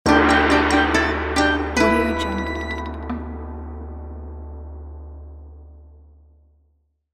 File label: cartoon kung fu fight musical accent